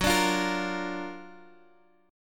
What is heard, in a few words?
Gb Augmented Major 7th Guitar Standard; Gb+M7 strummed; JGuitar